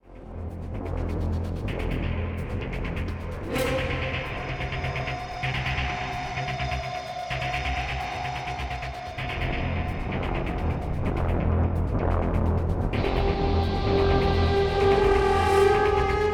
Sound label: Intro Signal